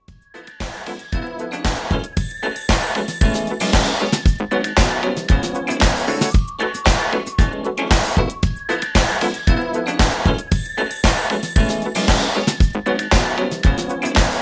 80s Funk Redrum 115 Bpm

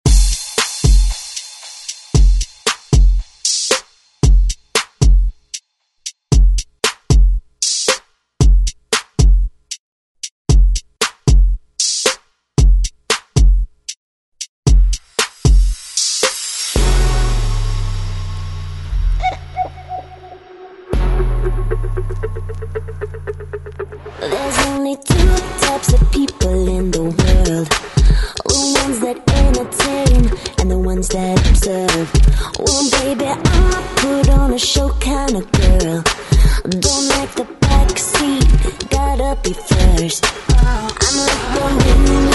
ReDrum; DJ Mhark

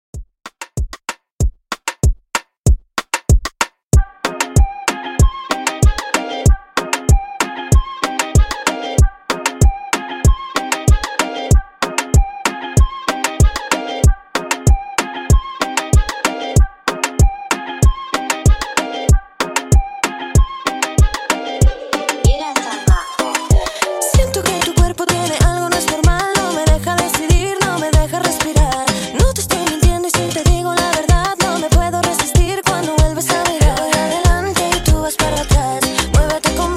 Bouncy Reggaeton 95Bpm

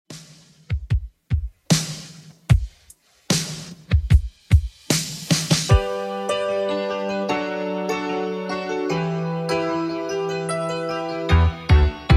80s Redrum 75 bpm

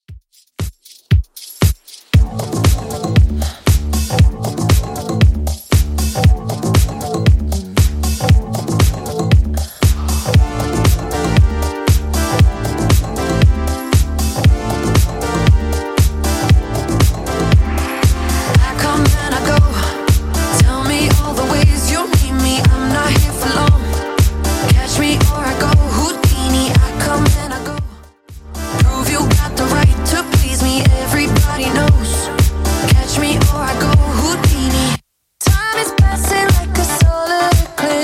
Top40 Redrum 117bpm

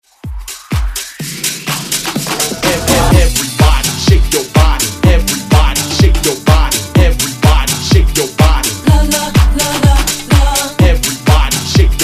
Dance Hype

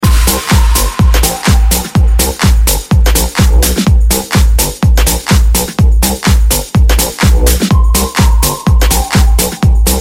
70s House RMX; Mainstream VIP Club Party Up; Vegas Edit

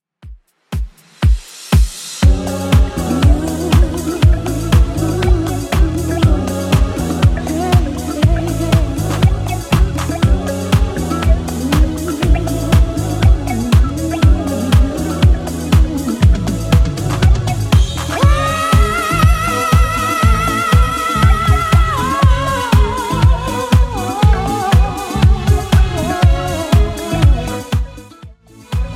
80s Redrum 120bpm

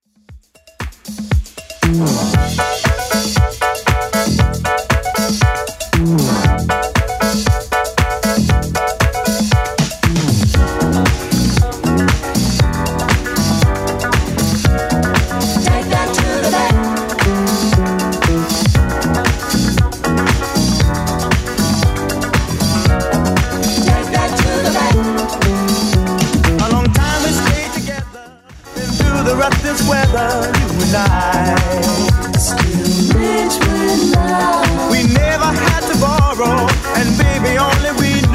70s Redrum 117bpm

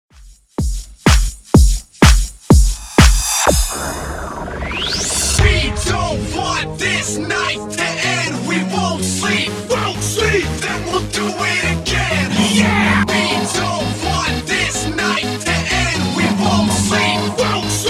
hype intro Redrum 125Bpm

